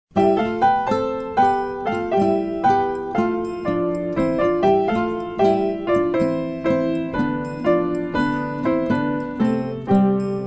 classical

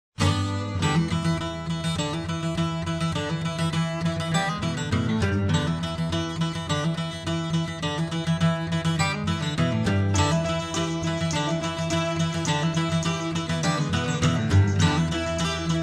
country

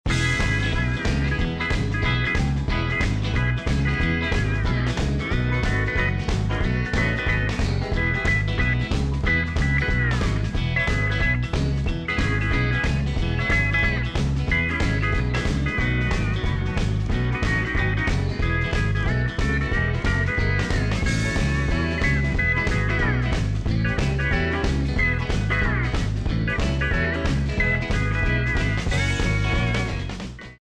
country swingin low

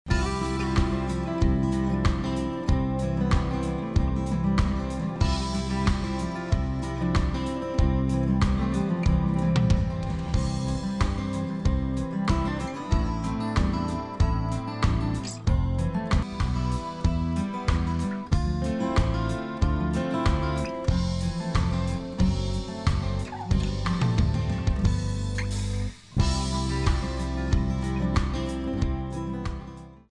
country

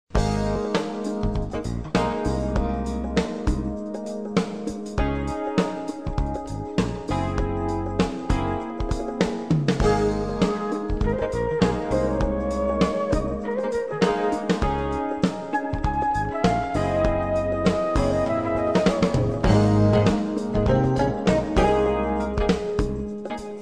Light Jazz

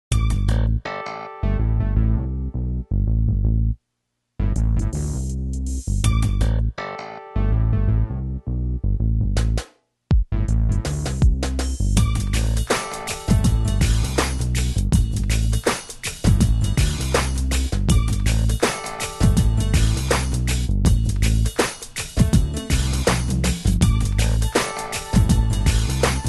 contemporary urban pop

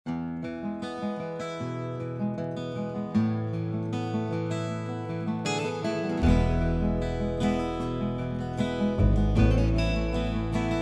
Cajun

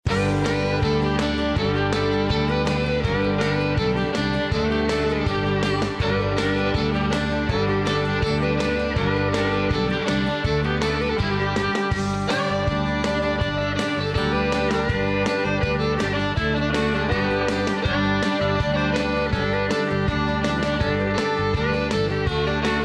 country

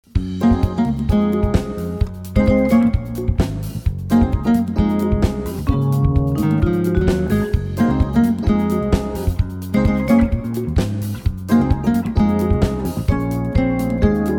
light jazz

